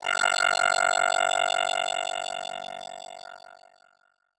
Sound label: Title Transition Electrical; Adobe; Production Element